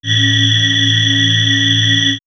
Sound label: Swirls, pads; X-Static Goldmine